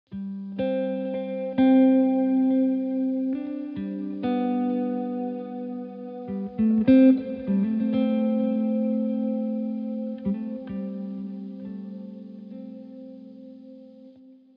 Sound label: Guitar Concentration for Work